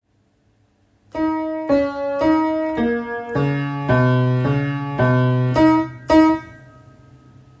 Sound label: Piano